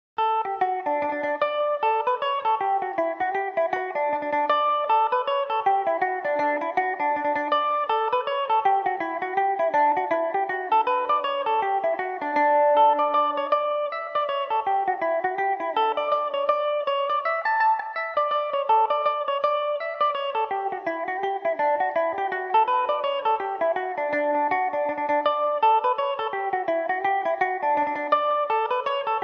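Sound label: D major revelation, irish mandolin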